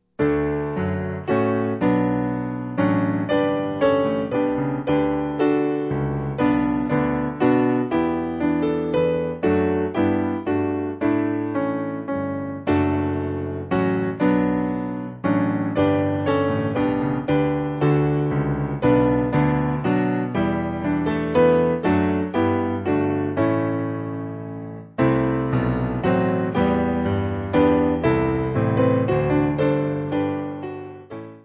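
Piano, Free Music Backing Files for Churches - Piano, Band, Pipe Organ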